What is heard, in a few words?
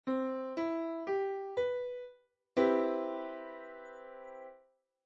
CM7 chord